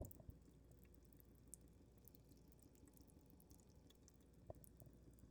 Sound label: Dripping water